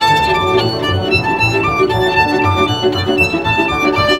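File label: VIOLIN GROOVE; Best Service; World Colours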